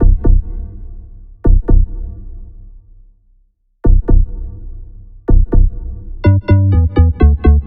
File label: Deep Marimba Bell